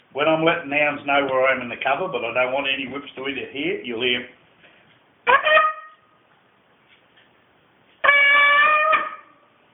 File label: huntsman's here, calls